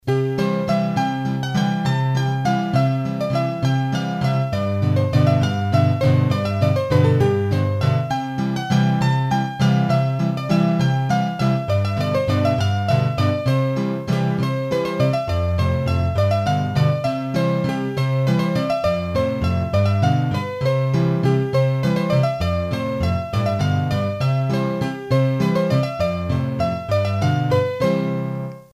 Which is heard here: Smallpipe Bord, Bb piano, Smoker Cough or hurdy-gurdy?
hurdy-gurdy